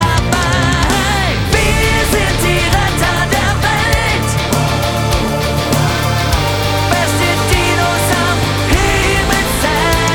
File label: heavy